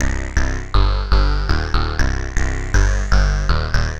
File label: Blown Bass; Sonic Foundry (Sony Creative Software); The Electronic Music Manuscript Collection; Bass Foundations